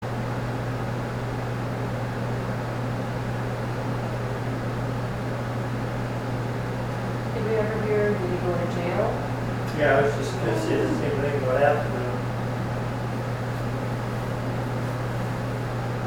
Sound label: Moan; Wabeno